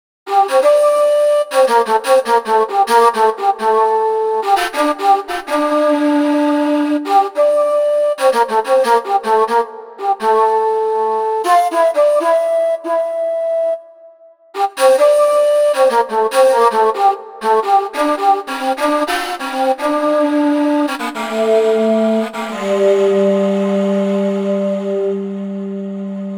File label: Shakuhachi